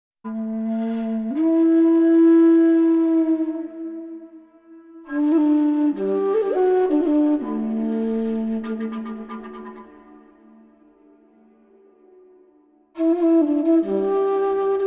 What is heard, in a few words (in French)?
Flute Silencieuse